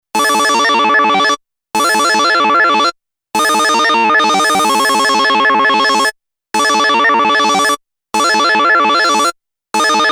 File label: riff by MFB NANOZWERG; Retrogames music Synth remake; Commando - Capcom (1985)